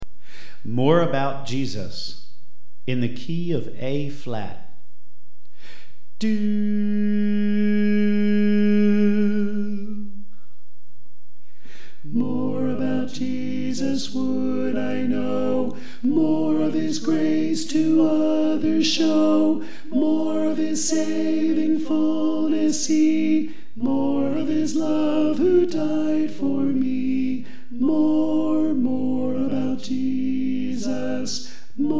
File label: Alto